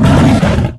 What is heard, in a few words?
giant make hit; mutants; STALKER; customnpcs